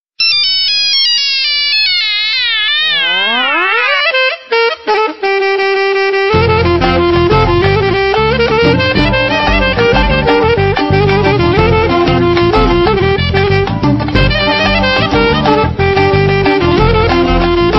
Albanian Nokia Tune

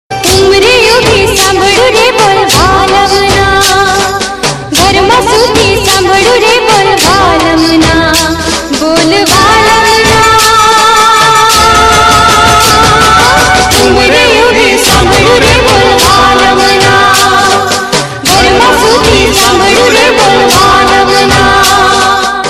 Garba; Bollywood

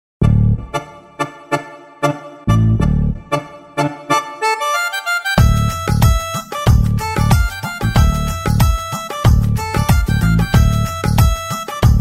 India Music

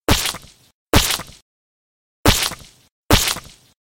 new sms

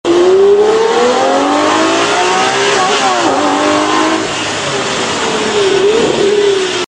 Murcielago Lp640 Rs

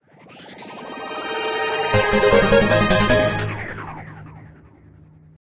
Blips

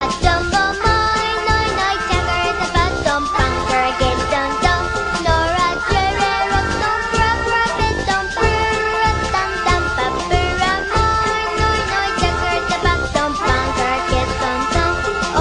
Title Song; Loco Roco

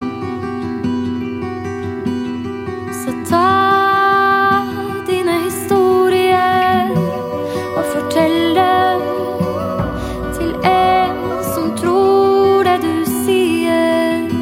Mysterious ringtone

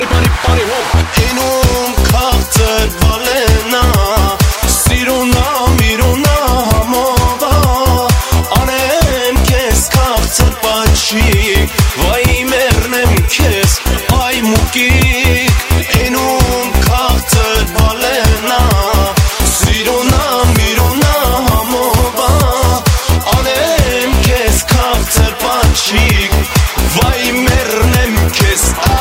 Armenian

